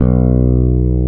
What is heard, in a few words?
BS FLS BS, Warm Fretls, E.Bass; Roland L-CD701; E.Bass 5